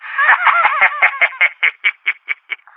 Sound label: player heeheehee; Allegiance